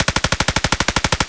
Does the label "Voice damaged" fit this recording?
No